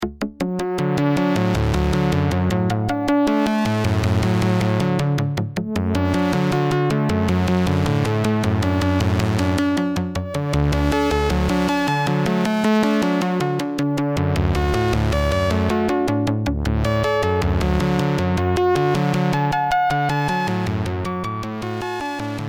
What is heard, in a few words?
open filter